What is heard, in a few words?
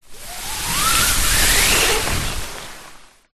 tent hand rub on side wall inside sheet; foley